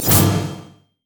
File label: riposte; LoL; fiora